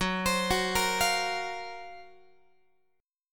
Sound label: Gb Major Sharp 11th Gb Guitar; GbM#11 arpeggiated; 4A,4E,5A,5D,5G#,6C#